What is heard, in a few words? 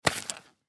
Gas Mask Grab Fast Pick Up; Military; Breathing; Human; Warfare; Blastwave